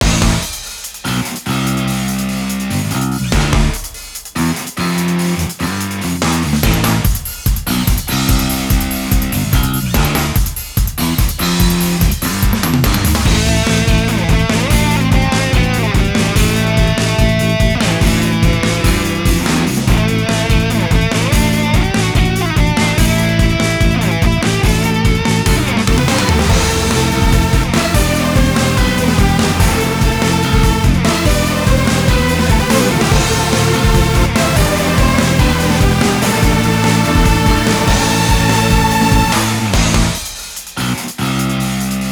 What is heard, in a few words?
BGM Minigame Tennis; Kenka Banchou 6 Soul Blood; 3DS